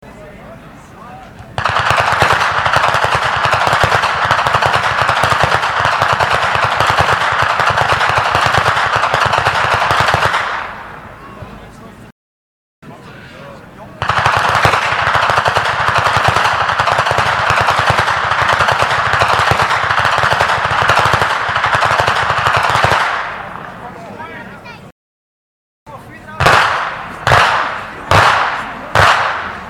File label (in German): Schnalzen; Ulrichshogl; Ainringer Schnalzen